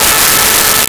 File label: Trumpet A4; Nintendo; July 2020 Gigaleak